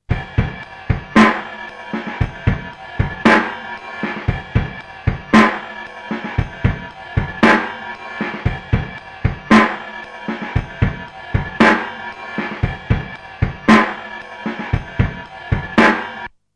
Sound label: drum lofi